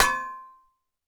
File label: 2 handed cleaver strike; Rust